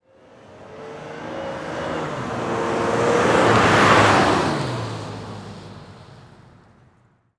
Transportation Truck Envoy Drive By Medium

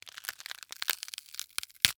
Horror Scary Bones Breaking Wet Slow

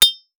Weapon Sword Hit Rock; AdobeCC

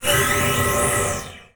Multimedia Internet CD-Rom Flash Wipe

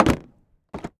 Helicopter Door Close And Latch Exterior; transportation

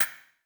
Multimedia Internet CD-Rom Flash Click Mechanical

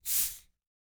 Household Bottle Open Soda Hiss

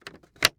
Case Lock Plastic; Foley

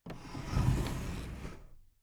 Foley Wood Table Drawer Sliding Open

